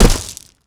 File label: land gravel, footsteps; player